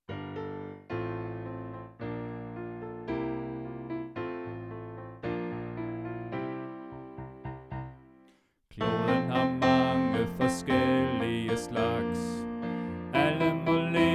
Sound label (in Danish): Sopran